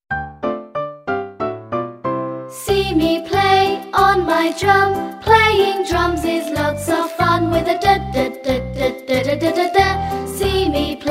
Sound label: Jolly Jingle for Sound of d